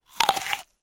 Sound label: human eat apple